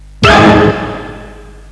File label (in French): orchestre; Bip Boing & autres